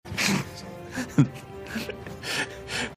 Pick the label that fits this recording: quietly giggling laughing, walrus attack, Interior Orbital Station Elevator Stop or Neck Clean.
quietly giggling laughing